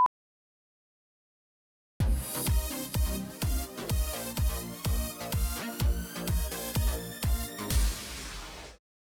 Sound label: Check Out Music; SuiteSpot; RnB Divas LA S2